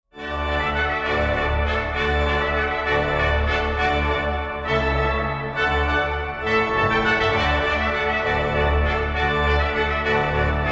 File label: Vivace, trompete orgel; Telemann